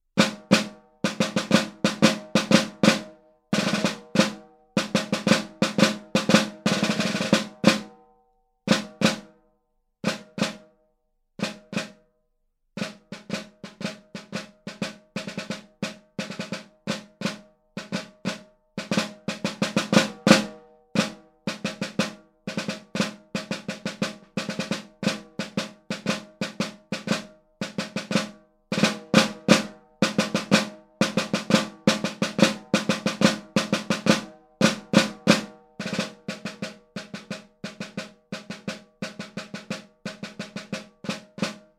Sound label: snare practice